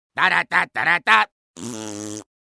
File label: ringtone; G&W